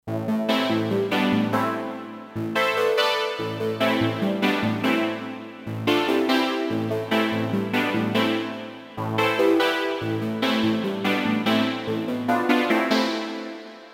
FM Matrix Synth; Iridium Core

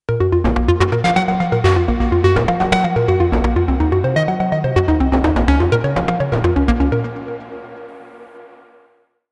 UNO Synth Pro X Presets

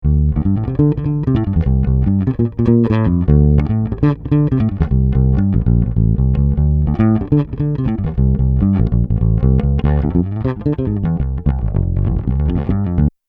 Chandler TG 1 Bass THD

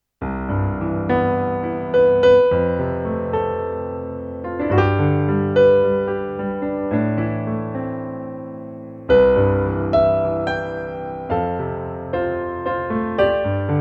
Korg Pa1000 Grand Piano

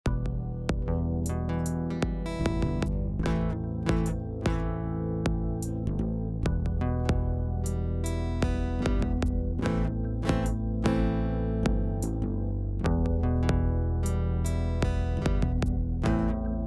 SPL MixDreamXP SPL

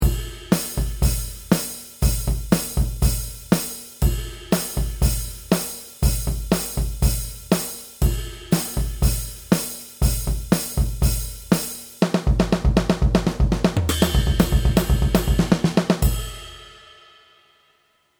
Yamaha FGDP 30 Set

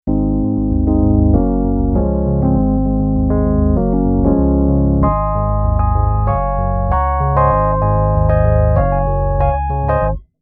Nord EP2 Ideal Timbre

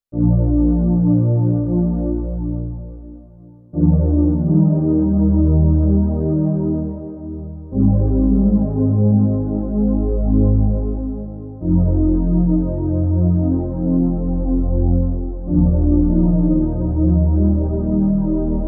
Yamaha P525 Dark Pad

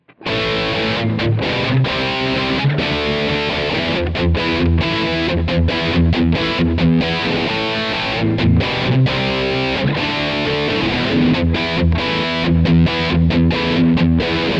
Kemper Profiler Player Fireball Rhythm; Rig Exchange

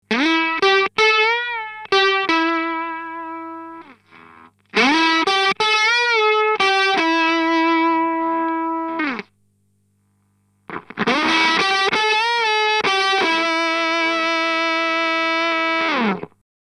Death by Audio Octave Clang Octave up Lead

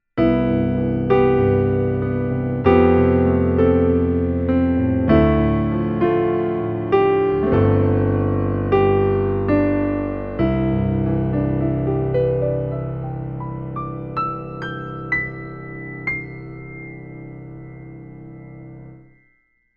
Casio PX-S5000 Piano

